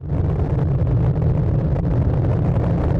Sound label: rocket; JetTank